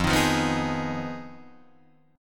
F Minor 7th Flat 5th F down Guitar Drop D

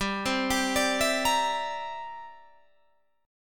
C 9th G down Guitar Standard; C9/G arpeggiated

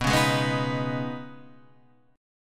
C sharp 7th Suspended 2nd B

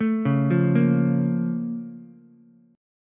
Bb Minor Major 7th Bb down Ukulele, BbmM7 chord; arpeggiated; JGuitar

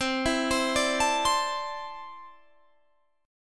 C 6th Add 9th C down Guitar Standard; C6add9 arpeggiated

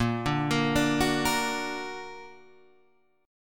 A sharp Augmented A sharp; x,x,8,7,10,9,8,7

